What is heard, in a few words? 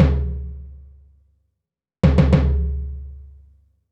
All BPM Style Dholki Beat